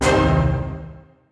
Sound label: symbol hit scatter